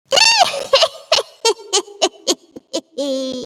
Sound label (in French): sorciere rire